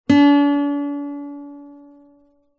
musical guitar nylon higher string pick stop finger